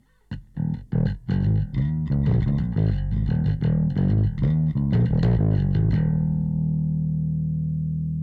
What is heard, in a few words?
bass E Bass; Neural Q